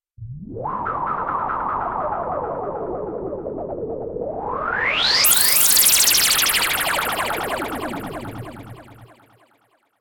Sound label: Reson Syn